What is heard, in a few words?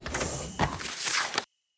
documents open